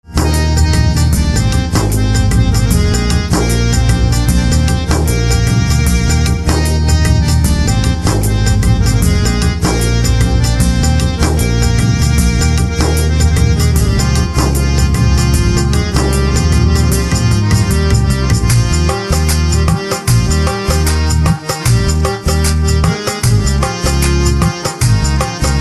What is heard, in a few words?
Harmonium Instrumental Ringtone